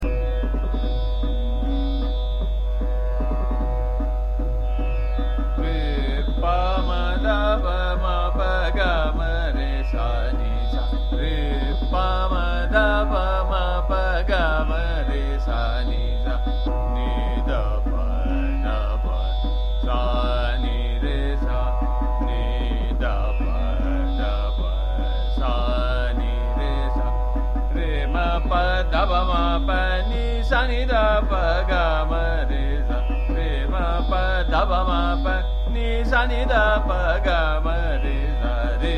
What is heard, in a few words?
Sargam - Re Pa Ma Dha Pa Ma Pa - Aada Choutal Drut, Shyam Kalyan